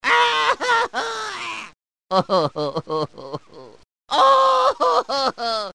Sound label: cry; luigi